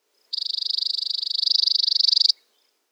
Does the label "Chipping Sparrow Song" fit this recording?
Yes